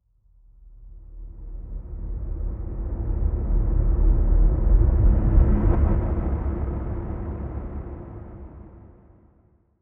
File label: Riser